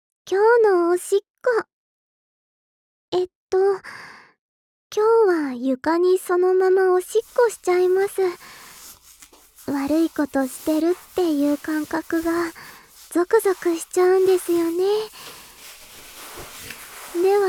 今日のおしっこ 床におしっこ

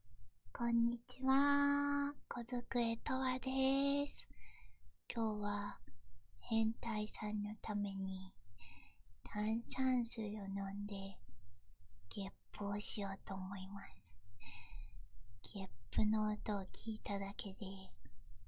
ロリメスガキが煽りながら炭酸水を飲んで耳元でゲップする

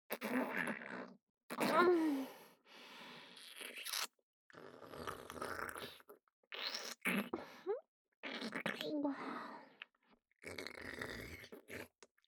妖艶 ねっとりフェラ, ASMR; フェラ素材

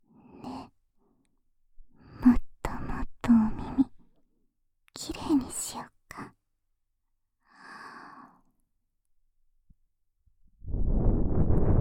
耳舐め 耳たぶ舐め

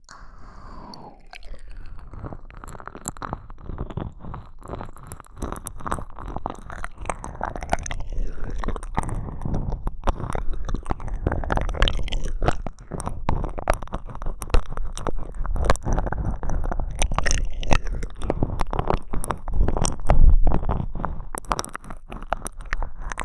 耳奥の奥コース

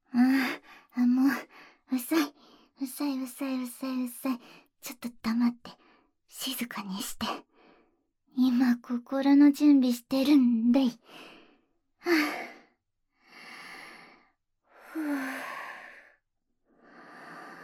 耳かきされたい; 2.合法的に耳かきされたい

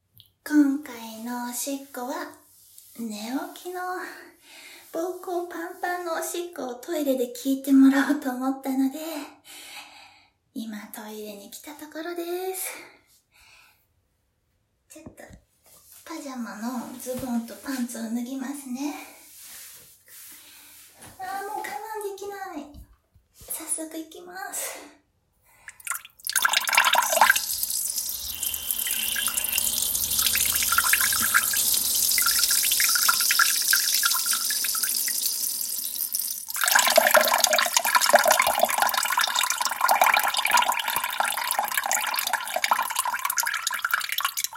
寝起きな 朝いちオシッコですッ

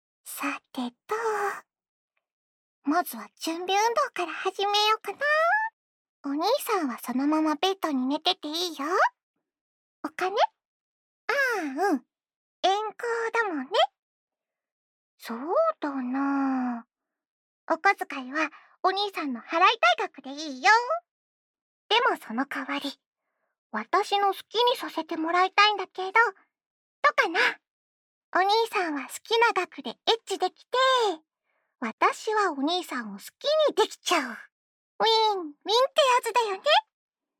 耳舐め（左耳）, ASMR; まずは準備運動の耳舐め（左耳）

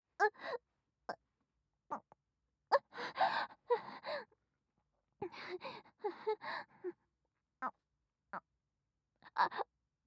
正常位喘ぎ; アドリブ集

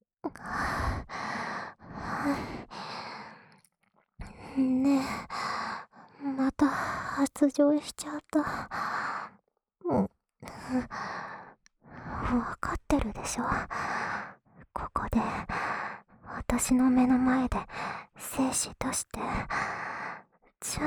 唾液少なめの耳舐めループ（左耳）

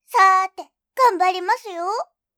Windows ログオン

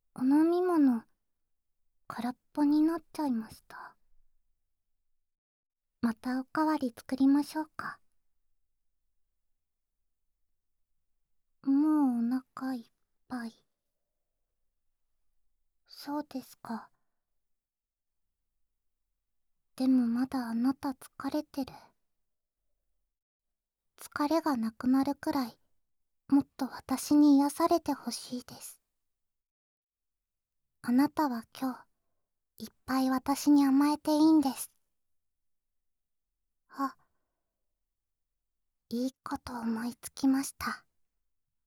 生ひざまくらで ていねいにお耳かき; まじめロ◯が身も心もとろけさせてくれる癒しの夜 ー誰もいない森のペンションで2人きりー

